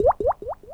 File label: login new; CosmicRage